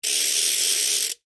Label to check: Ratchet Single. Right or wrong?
Right